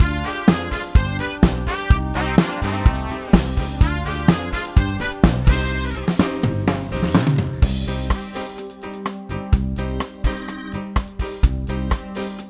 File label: SKA